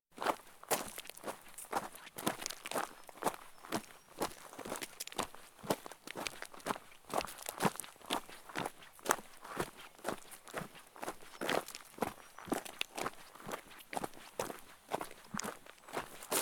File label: Footsteps Walk Stones Gravel Man; The 39 Steps